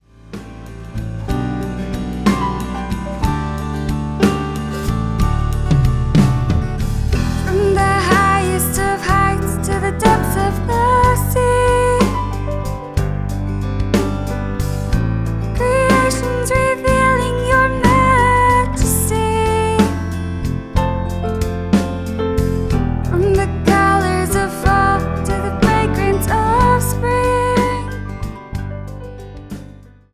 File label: band